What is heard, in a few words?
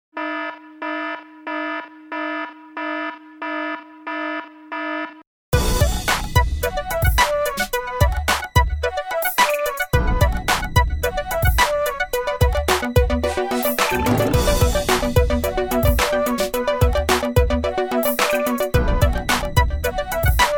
instrumental